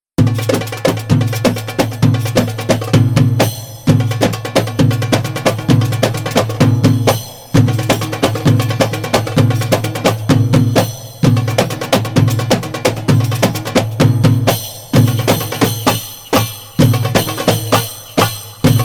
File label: DRUM SOLO